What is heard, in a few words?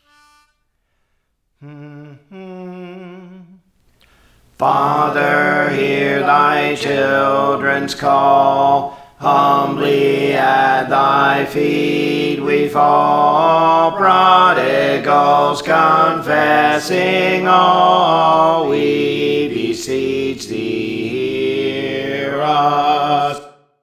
Alto; Praise For The Lord Training